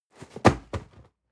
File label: cardboard box medium fall high hard; objekte